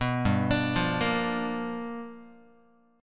G 7th Sharp 9th G chord, Guitar; G7#9 arpeggiated; JGuitar

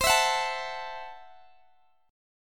C 6th Flat 5th C down Guitar Open D

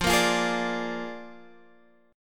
A sharp 7th F, Guitar

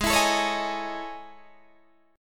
A Major Sharp 11th, Ab

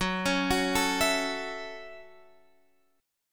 Gb Minor 7th, Guitar Standard; arpeggiated; JGuitar

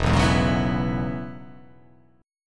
E 6th Add 9th E down

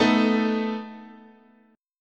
A Suspended 2nd Flat 5th, guitar chord

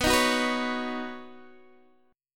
B Major Add 9th, Guitar DADGAD chord; Badd9; strummed

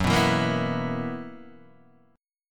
B Major 7th Suspended 4th Gb

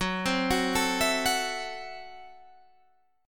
Gb Minor 7th Flat 5th, Guitar Standard; 14,15,16,14,17,14; Gbm7b5 arpeggiated; capo=2

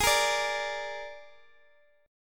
Gsharp Suspended 2nd Flat 5th, Guitar, Drop D; x,x,x,13,11,10

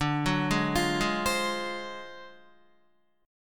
D 7th Sharp 9th Flat 5th, Guitar; 9,9,8,10,8,8; 4F,4A,5C,5G,5C,6E; arpeggiated; JGuitar

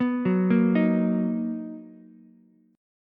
B Major 7th B down Ukulele; arpeggiated; JGuitar

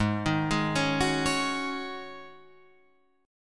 G sharp 7th Suspended 4th G sharp, Guitar Open F; G#7sus4; 8,10,8,8,9,8; arpeggiated

